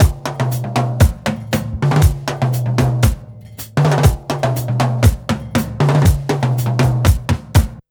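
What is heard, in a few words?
INDY BEAT1, 119 bpm; USB Soundscan vol.32; Roots Disco; AKAI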